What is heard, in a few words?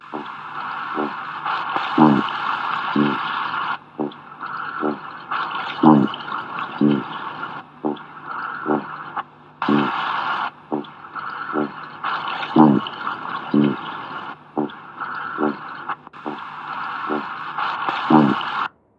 cod vocalisation